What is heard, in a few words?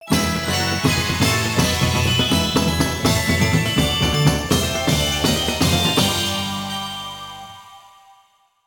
Horse Racing - Bond Level Max; mario sports superstars 2017 3ds gamerip